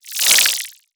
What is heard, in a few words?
Animation Electronic; Multimedia Internet CD-Rom Flash